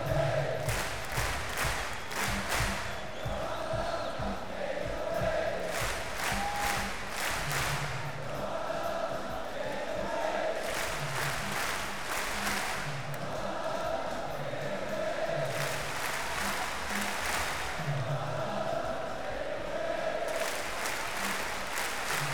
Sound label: Chant; akg414